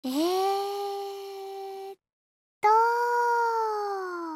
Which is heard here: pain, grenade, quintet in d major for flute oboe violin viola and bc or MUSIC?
grenade